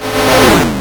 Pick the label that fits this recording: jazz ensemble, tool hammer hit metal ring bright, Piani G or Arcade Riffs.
Arcade Riffs